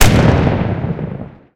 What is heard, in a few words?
gun shot; bereda-safat 77; War Thunder